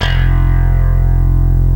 slapbass; jazz jackrabbit 2

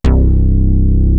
SUN BASS, KORG; WS KORG; East West; Global Sounds

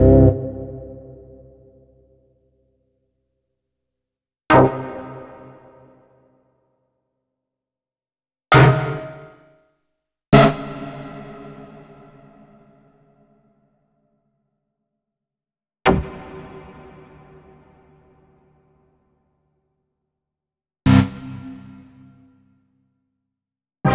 STAB

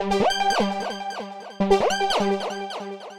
150bpm, future rave samples

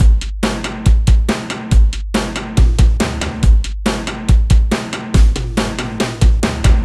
Drums, Loops; Trip-Hop

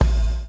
BLOW; Spectrasonics - Backbeat

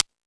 Timbale, E-MU Pro-Cussion

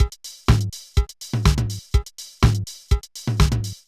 Loop, mxr; classic machines

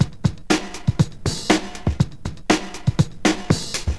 Simple Break, breakcore

